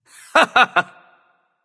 heh heh; smite

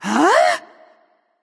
confused; smite; thanatos

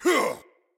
attack grunt; smite; odin

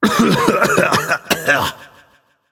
clear throat; smite; zeus

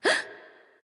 nv breath; smite; awilix

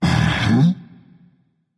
agree berserker; cuchulainn

